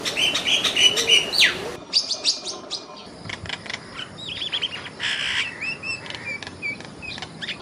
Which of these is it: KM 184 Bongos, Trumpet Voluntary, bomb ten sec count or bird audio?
bird audio